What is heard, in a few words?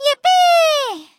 Yippee; Teto; SM64 CO-OP; Triple Baka Pack